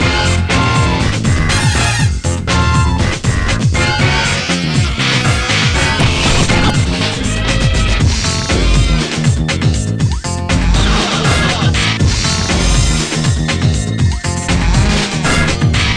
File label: Walking Music